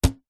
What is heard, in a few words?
balloon foil small inflated bash hard; foley